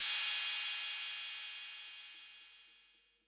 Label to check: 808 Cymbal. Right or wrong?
Right